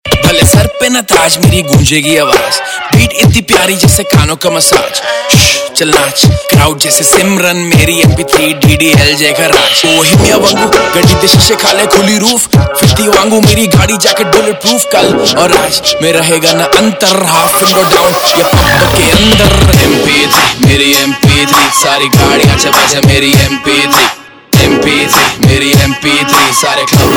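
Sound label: Ringtone, Punjabi Pop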